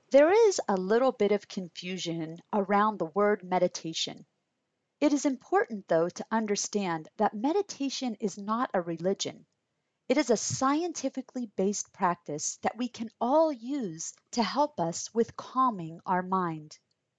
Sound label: Breathing